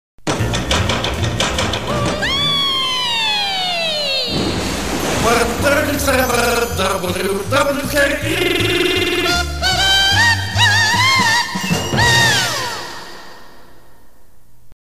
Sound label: jingle gurgle; 137 WWKE